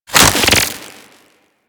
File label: Dual Minigun Reload